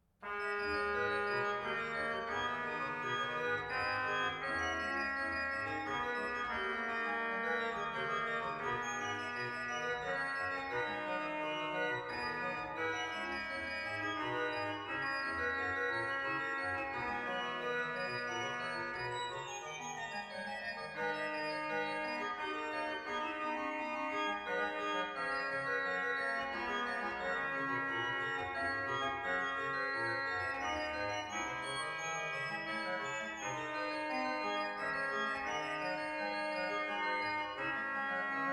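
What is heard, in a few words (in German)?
Sei gegruesset, Orgue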